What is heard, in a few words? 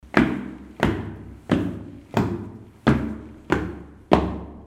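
stomping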